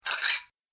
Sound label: Saucers Moved Apart Noise, dishware; Household; Restaurant, Diner